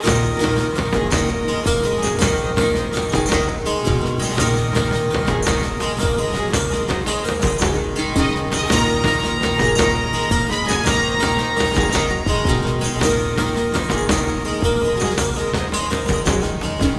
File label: Boss combat; The Hobbit; PS2